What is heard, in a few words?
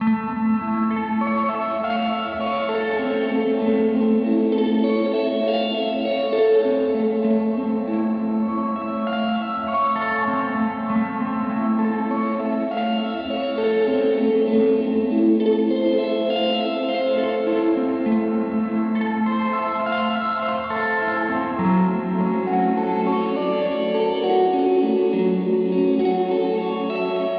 Walrus Audio ACS1 Fullerton Stereo